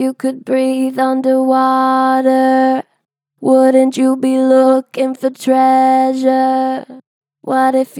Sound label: Strong Flat, Harmor Vocals, Pitch Flat Strong; What if you could breathe